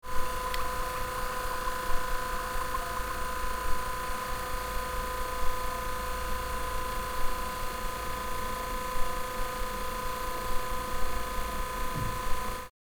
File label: VHS video cassette static noise